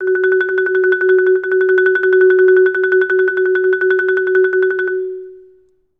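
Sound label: TREMOLO F#4, Orchestra; Best Service; Peter Siedlaczek's Advanced Orchestra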